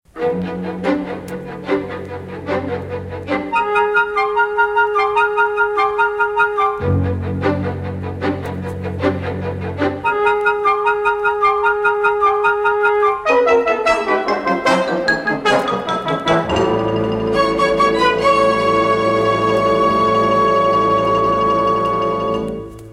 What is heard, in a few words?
Mechanical Bridge, Cartoon Music; Cartoon Music Discs